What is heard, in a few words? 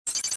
fuelpump; Star Wars Jedi Knight II Jedi Outcast; XBOX